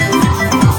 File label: match; ulti match